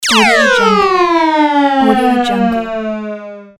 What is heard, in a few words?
laser